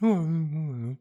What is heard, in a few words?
Hum hum hum